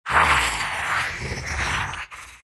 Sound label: big snarl; Dota 2; Undying